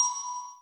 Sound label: Bells